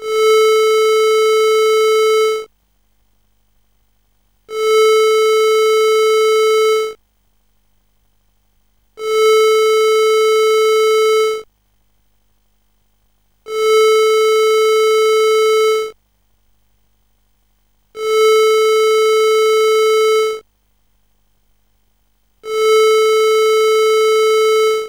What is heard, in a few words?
Tuning Fork; Musicraft